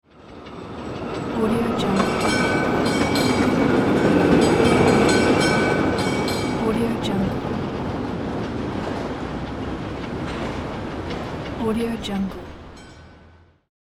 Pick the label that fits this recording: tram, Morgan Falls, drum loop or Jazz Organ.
tram